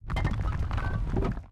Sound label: piggybank break rumble; Candy Crush Friends